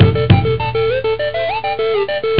SQUENCE, Synthé

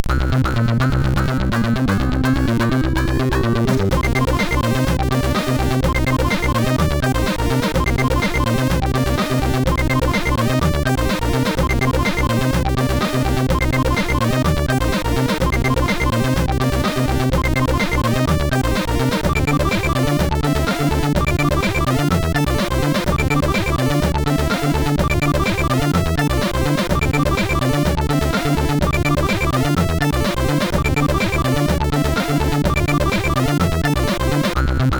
guitaring, SIDTunes

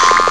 boingity

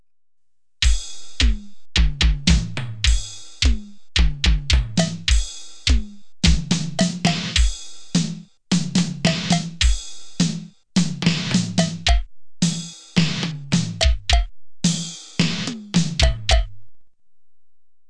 rhythm

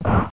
Catch; Bible Fun Pack; NOAH

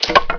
step rubble; SpecOps2 MPlayer Demo

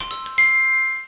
APPLAUD; Game Zone; TRIAD

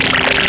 car water

